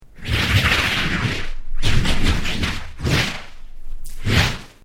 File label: Created Creature Medium Vocalise, Alien Sound Effects; Created creatures, vocalisations and pretend speech